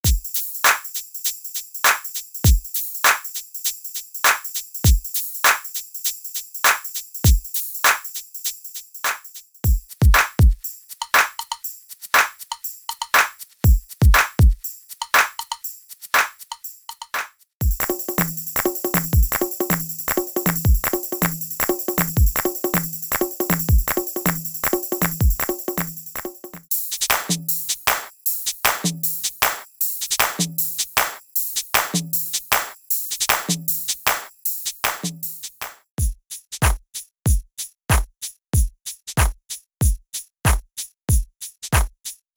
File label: Percussion Loops